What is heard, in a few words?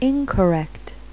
incorect